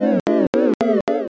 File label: laugh crowd